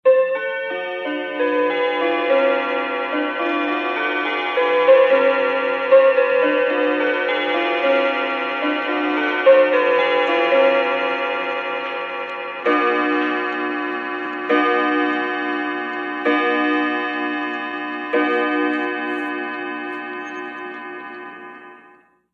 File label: Mantle Clock Chimes